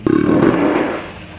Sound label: burp fart